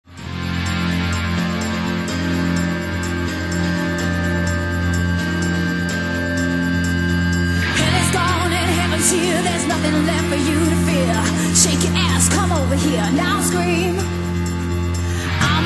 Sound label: covers band